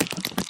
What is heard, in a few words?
step, spider; ayuncraft-1.5; mob